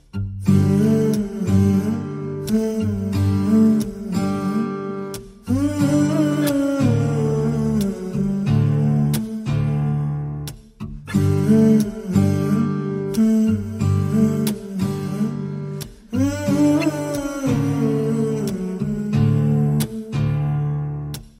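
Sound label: guitar ringtone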